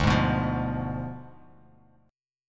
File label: D Diminished 7th D down Bass Standard; strummed